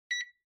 Air Conditioner Beep

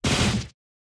Cut

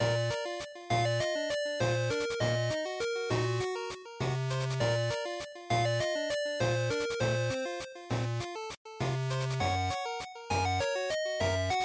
GB RPG ED Loop100

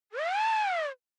Police Car Siren Fake Fast

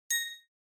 Bell Accent, Low Dry

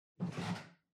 Washer Front Load Far Filter Upper Remove

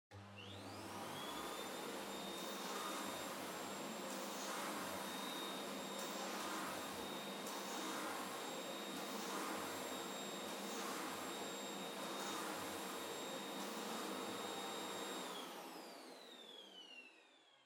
Vacuum Cleaner Far Vacuum Floor Soft Mid

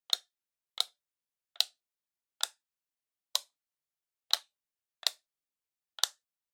Outlet Timer Pin Pull; コンセントタイマー02-09(ピン 引く); タイマー機器

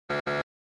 Quiz Buzzer Multi

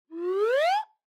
Slide Whistle Mid Up

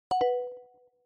Smartphone UI Sound Dry

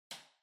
Light Switch Rocker Far Hall Mid

